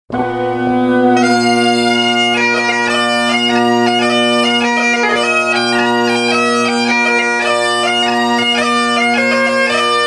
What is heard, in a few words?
Banjo Breakdown